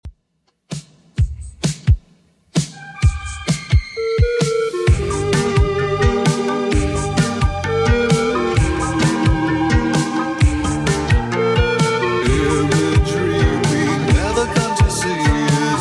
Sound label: 80s 130 bpm; Redrum